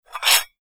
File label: stacking small plates